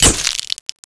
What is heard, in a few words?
DROP